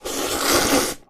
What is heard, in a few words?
eat noodle single